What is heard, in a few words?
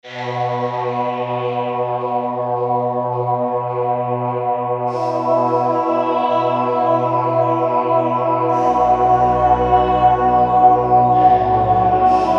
Orion Voice, synth